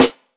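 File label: Snare, classic hip hop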